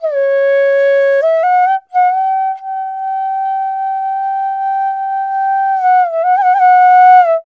INDIA ALTO

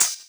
Hhat, Hihat; Equipped Music; Loungin House

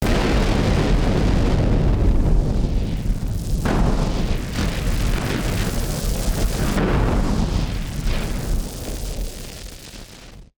Party Popper FX

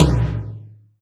tom lo, Alesis DM5

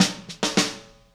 Beat Intro, Indie Rock Anthems; Sonic Foundry (Sony Creative Software)

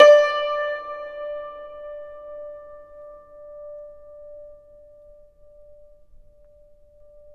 YANGCHIN; INIS - Opium

